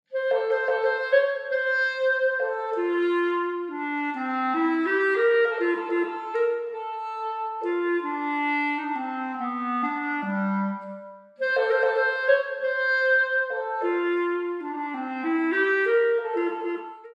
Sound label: Shadow Clarinet